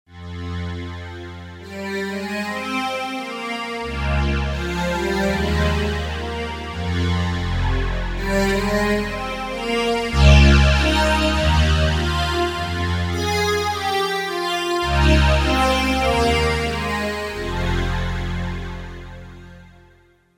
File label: Wire Strings, Roland JV-880; Factory Patches